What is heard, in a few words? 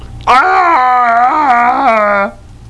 AAAHHHRR; VOICES